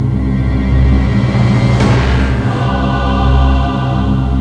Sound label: Very Scary